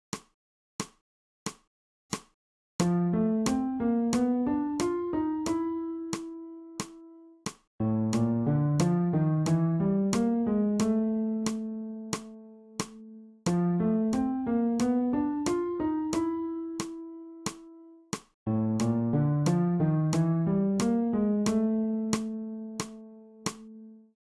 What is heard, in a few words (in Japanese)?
新フレットレス